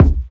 raw bass drum one shot d key

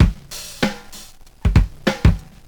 97 bpm 00s breakbeat A sharp key; drum loops

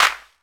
hand clap sound D key; percussion; single hits one shots

